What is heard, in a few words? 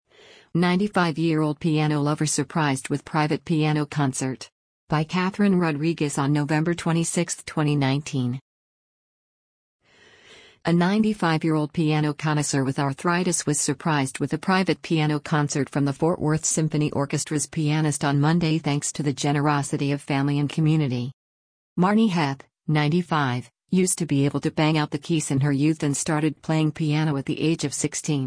piano concert; 95 year old piano lover surprised with private piano concert